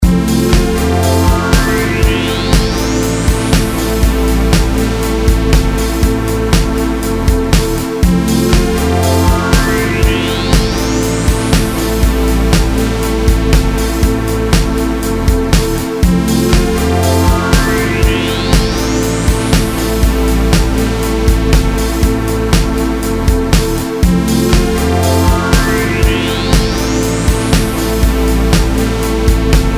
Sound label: Synth Energy